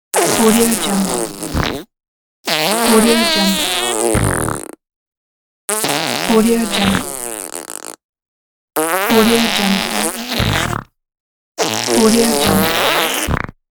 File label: cartoon farting